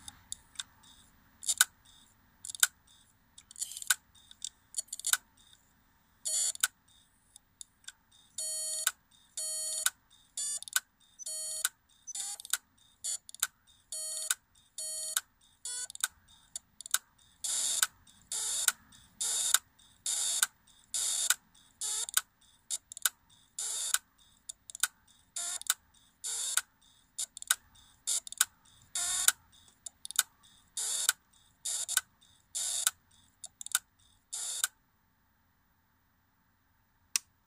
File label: renzoku abnormal sound